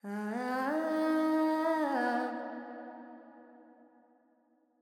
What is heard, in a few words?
Verb Phrygian, Vocals, Carnatic Female, Alaps; Sample Banks